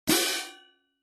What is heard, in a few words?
20in Symphonic Viennese Pair